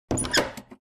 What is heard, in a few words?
Door opening unlock